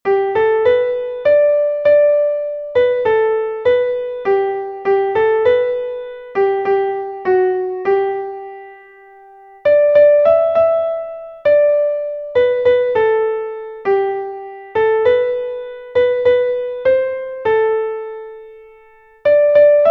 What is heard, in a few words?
Blessings Melody